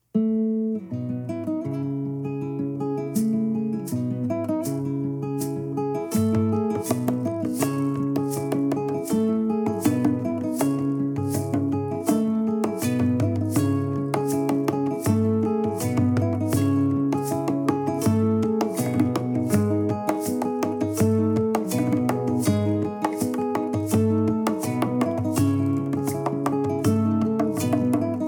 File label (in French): musique accompagnement seul; Accompagnement instrumental; hyene